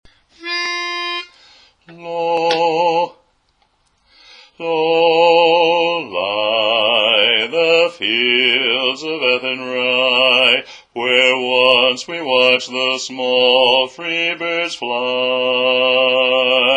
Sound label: (Bass)